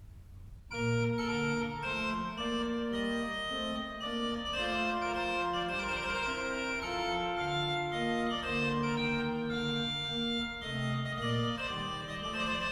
Organ Concerto in Bb Menuett; Handel

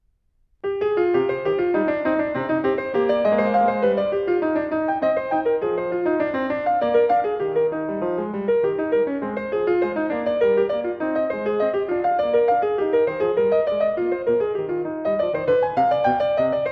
Courante; Bach French Suites

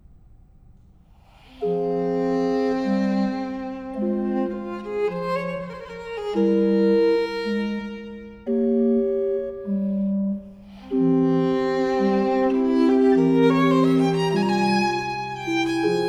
Violin Sonata, Adagio; Op.1 No.3; Handel

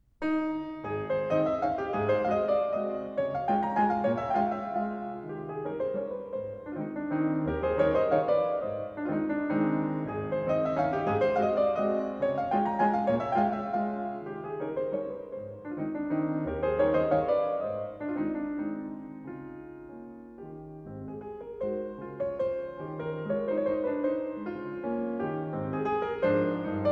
Mazurka in A flat, Piano

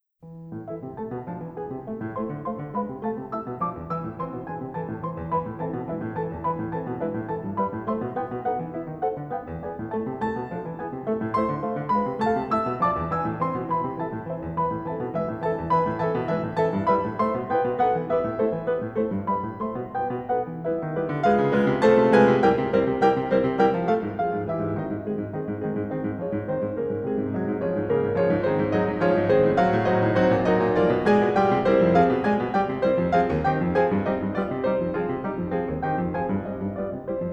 Op. 25 No. 4 in a Minor, Piano

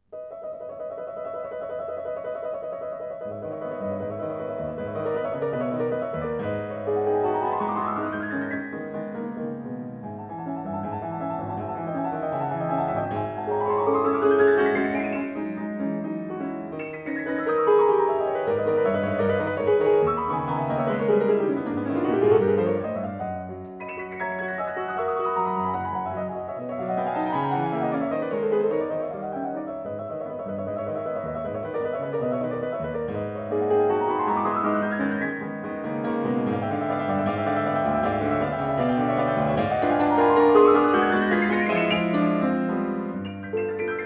Etudes, piano; Chopin; op.25; Sol diКse mineur

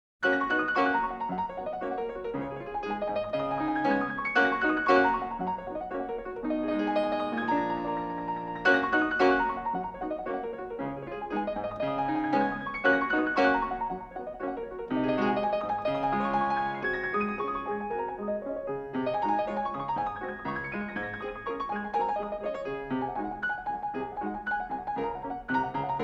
Etude in G♭ major, Piano; Op 10 Nr 05; Black Key; Chopin; Vladimir Horowitz

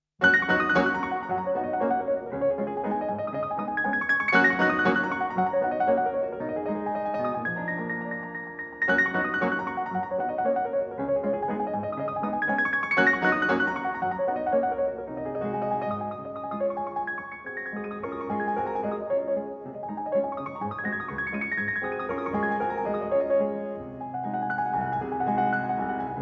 Op. 10 No. 5 in G Flat Major, Piano; Black Key

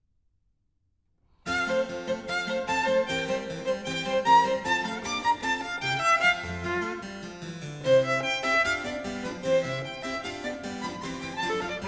Violin Sonata In F Allegro; K46e; Mozart

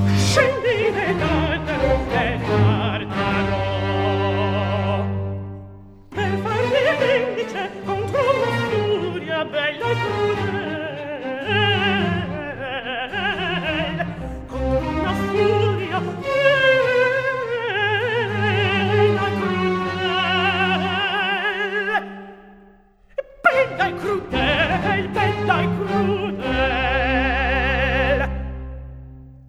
Aria; Orlando; Scendi nel Tartaro; Antonio Vivaldi (1678 - 1741); Vivaldi - Orlando furioso (2004); Atto III

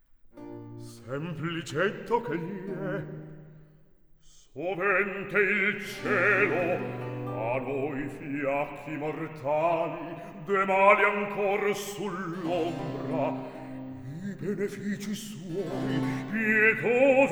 Recitativo; La Silvia, RV 734; Antonio Vivaldi (1678 - 1741)

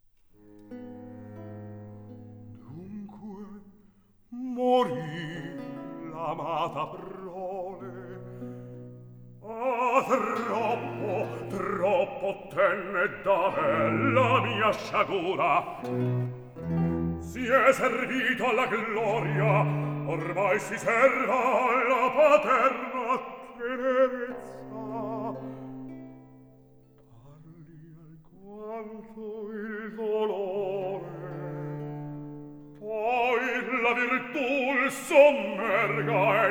Recitativo, Arie per basso; Il Farnace, RV 711-D; Antonio Vivaldi (1678 - 1741)

